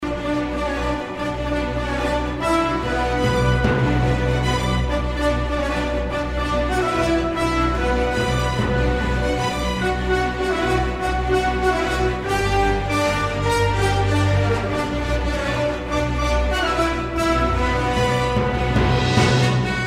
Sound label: Solo